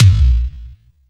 KICK BUTT; E-MU Formula 4000 Series Vol. 2

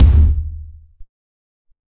Kick, Drum & Bass; AMG - Prototype Drum & Bass

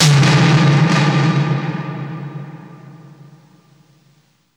THUNDER TOM, SPACEY DRUMS; Spectrasonic Distorted Reality 1

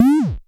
HI TOM, Dual Percussion Synthesiser; Klone

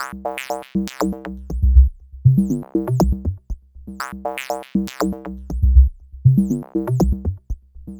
Synth

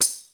DRY P TAMB, drum; Crypton; DrumBase5000

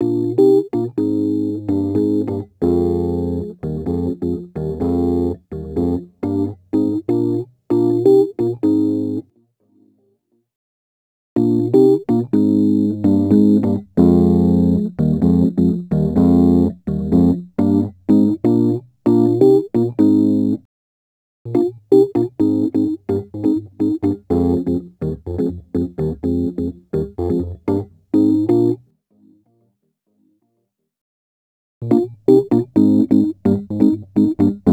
Rhodes Attacks; Ueberschall

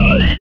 Jungle Kick; Sonic Foundry (Sony Creative Software); Esoterik Beatz; Jungle Kit

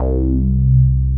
DIGALO C3P, DIGALOGBS

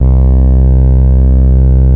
Taurus, Moog Taurus; Greytsounds VOL-1

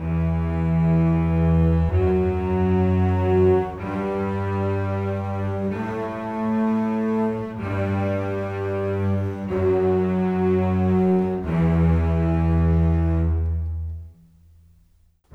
Bass Cello, Orchestral; Sonic Foundry (Sony Creative Software); Orchestral VOL-2

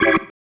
Stab, Organ, One Shots

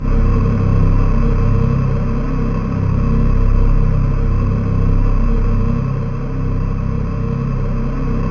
C1, Synth Leads

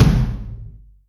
Rock, Acoustic Tom

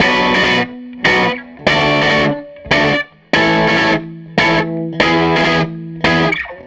SG Guitar Loop

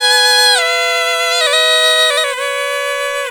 PUNGI

